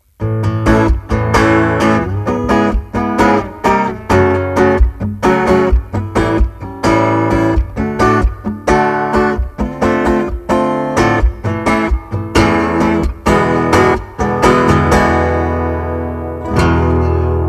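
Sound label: guitar strum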